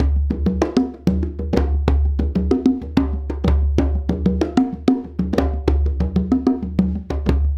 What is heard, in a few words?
Congas; Essential Percussion